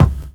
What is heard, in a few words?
BD, BASS DRUM, 70's Breakbeats